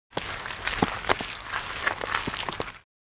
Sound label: Mud movement sloshing squish